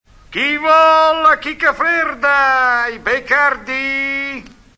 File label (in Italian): grido dell'ortolano